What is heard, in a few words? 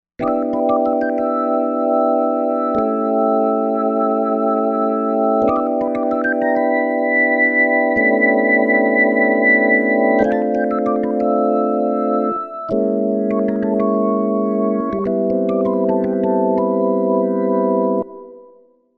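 Organ Flutey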